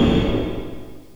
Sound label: snarelike